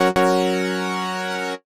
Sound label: workout complete